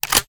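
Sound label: shotgun reload loop fast shell in; reloads; iw8; romeo870; gmod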